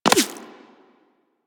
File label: Bullet Impact Flesh; Warframe; Weapons